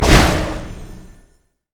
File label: rabidbite; LoL; trundle